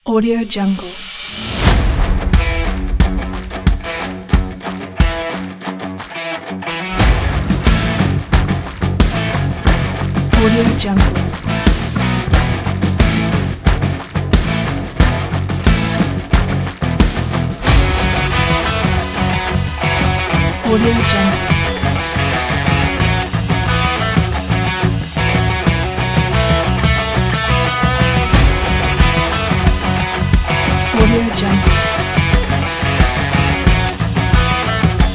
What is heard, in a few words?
groove rock